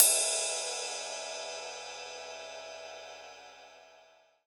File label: PAISTE BRIGH; Best Service; Alpine Volksmusik